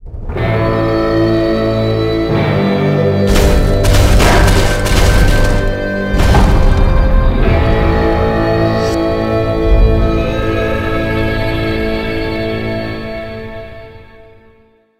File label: Lottery Win Jackpot